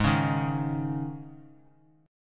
G sharp Suspended 4th chord, down; strummed

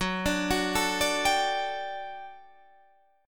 G Major 7th Suspended 2nd Gb

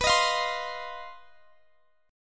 C Minor Major 7th Double Flat 5th, B, chord

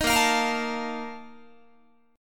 A sharp Major 7th Suspended 4th, Guitar; strummed

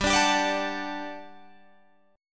G Major 7th Suspended 2nd G down Guitar Drop D

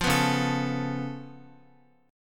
Db Augmented 7th Db down Guitar

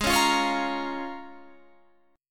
Bb Minor 7th Ab

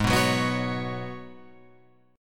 G sharp Minor 11th G sharp Guitar Open F, chord; G#m11 strummed; 8,6,6,6,9,8

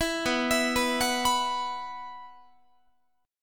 B Suspended 4th, Guitar; Bsus4; arpeggiated; 19,19,19,21,22,22

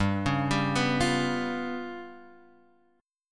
G 7th Flat 5th Guitar Open F, G7b5 chord; arpeggiated

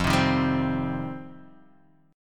G 7th Suspended 4th Sharp 5th F

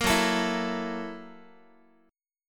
Gb 7th Sharp 9th Gb Guitar; Listen to Gb7#9 strummed

